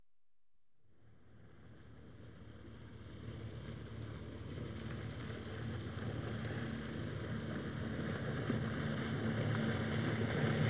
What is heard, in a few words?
Cabin Cruiser, Pass Right To Left; Ships And Boats; BBC Sound Effects Library; Ships And Boats 2